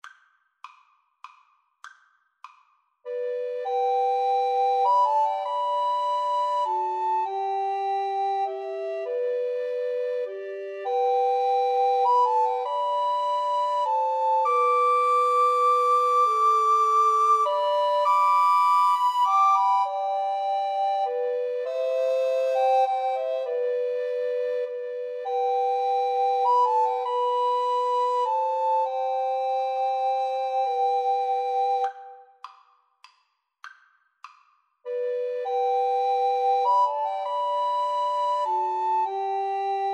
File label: amazing grace, Recorder Trio